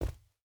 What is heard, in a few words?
footstep hide carpet jump land; Rust